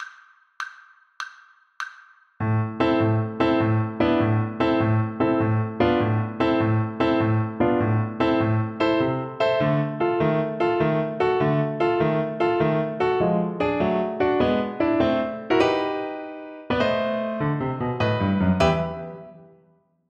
sorcerer apprentice, Oboe

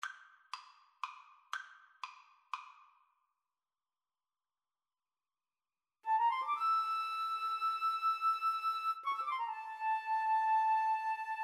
Bolero, Flute Cello Duet

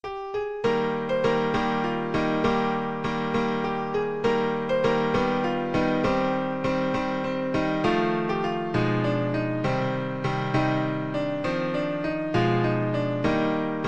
rimsky sheherezade, piano

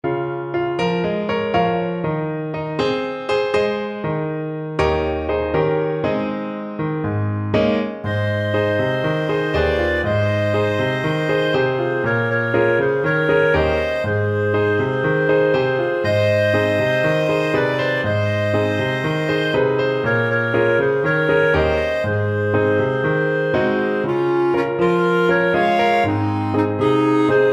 clarinet clarinet

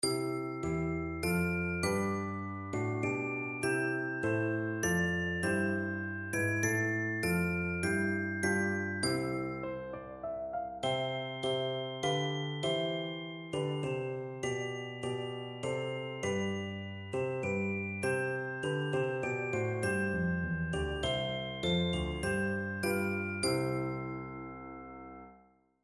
god save the queen, percussion

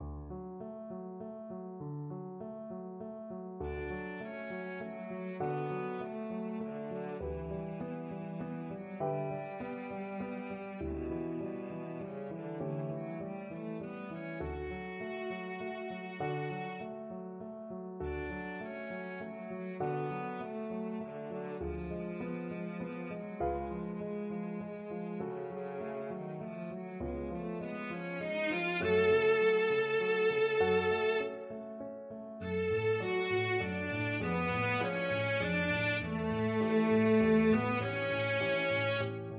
cello; saint saens swan

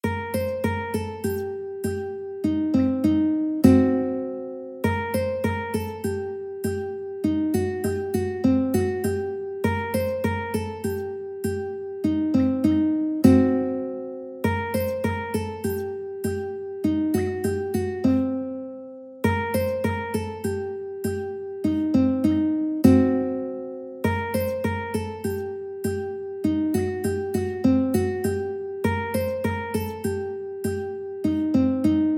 Rumanian Jamtland uke

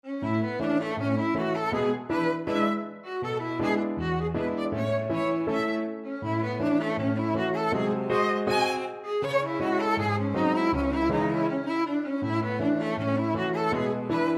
pizzicati, piano quintet

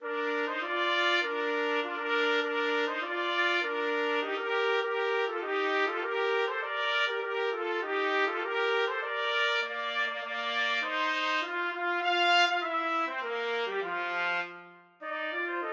trumpet duet

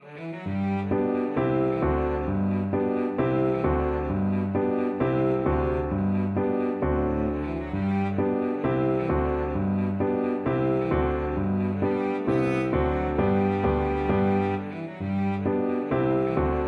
hornpipe, cello

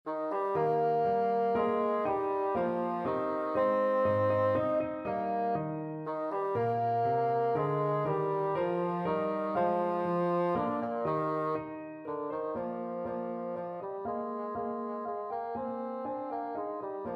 German Dance, bassoon

